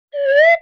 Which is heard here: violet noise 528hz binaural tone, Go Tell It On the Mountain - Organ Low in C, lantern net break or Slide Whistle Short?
Slide Whistle Short